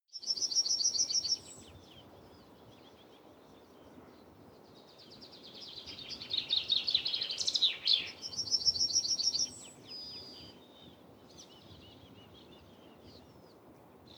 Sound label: insects birds